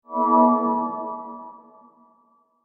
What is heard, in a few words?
Guitar Chord Musical Transition Reveal Chord Swell; Sound Design; Guitars (Sound Effects:Musical)